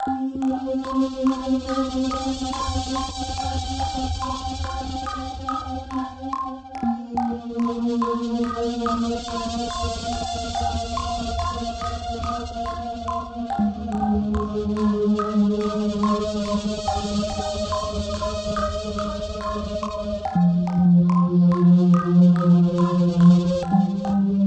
Beat Hi; GAUGE

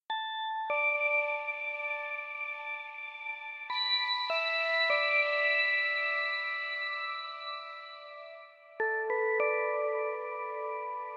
Melody Keys

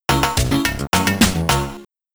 Grassy Plains Jingle

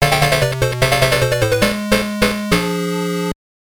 Jingle, soundtrack; Super Golf; Game Gear

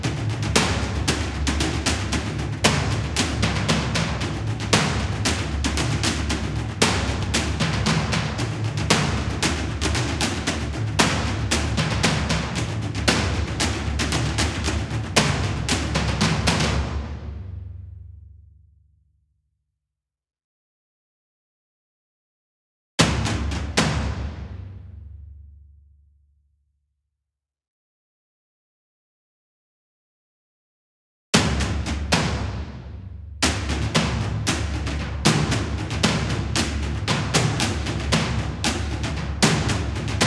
Instrumental Toms; nowhere station

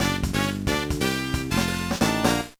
Jingle, gba; Backyard Baseball 2006

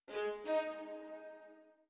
orbit violin; Sky Children of the Light gamerip

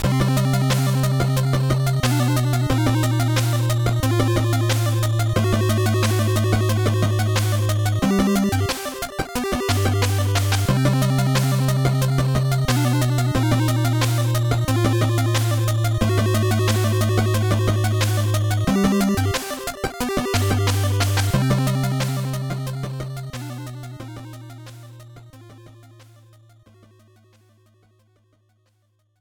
Dungeon; Chaos World; NES

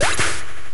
faint

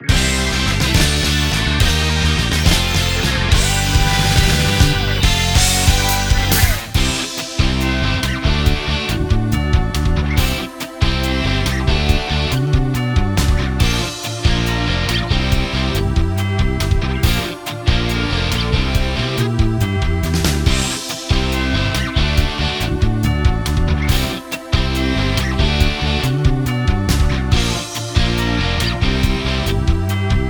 victory theme; Penny-Punching Princess; PS Vita

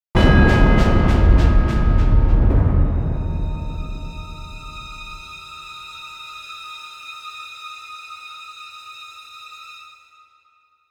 Intro long

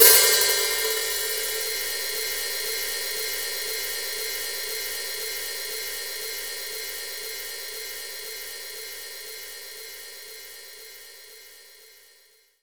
Hi Hat Open

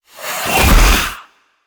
Egg Spread Voiced; children of morta; Scorpion King

